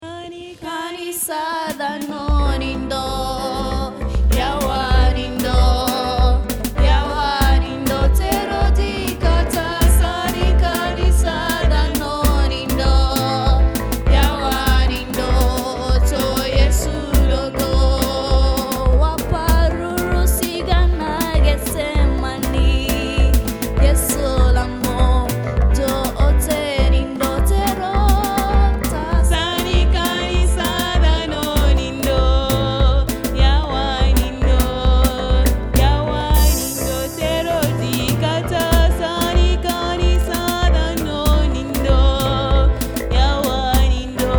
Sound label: Melody Song